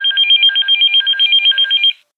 Phone, ringtones

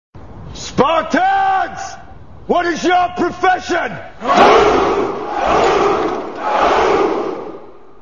messagetone

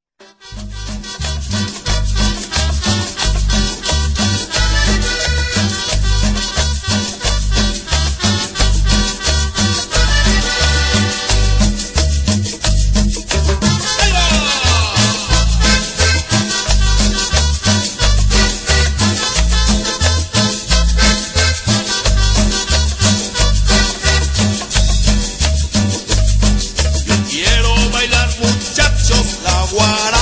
guaracha

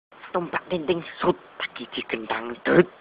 shout; children

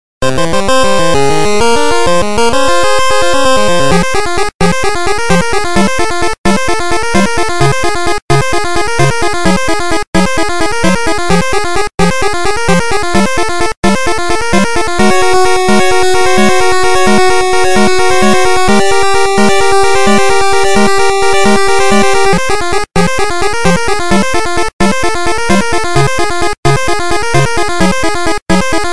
Victory Fanfare 8Bit

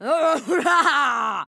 orc cheer

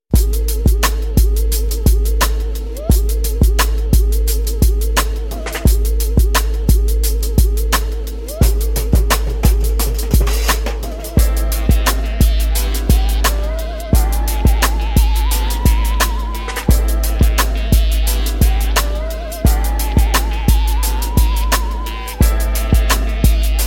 hot hiphop, ringtone

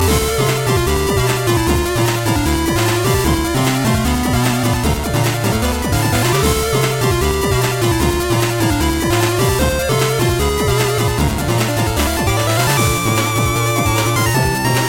powerful ring

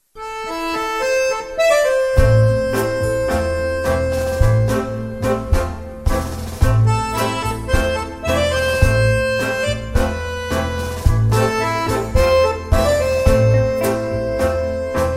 french ringtone; latino

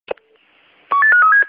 police tone

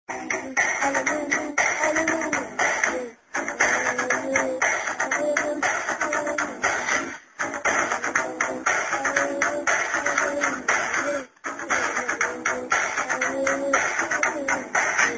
humming; children

